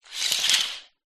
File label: household curtains net open or close